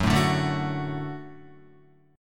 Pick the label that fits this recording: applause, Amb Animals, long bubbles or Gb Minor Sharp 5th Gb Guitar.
Gb Minor Sharp 5th Gb Guitar